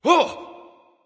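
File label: nonverbal surprised; smite; voicepack; ullr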